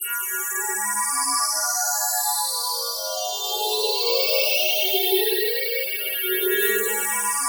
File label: ATMOSCHIME, Pads; Distored Reality 1&2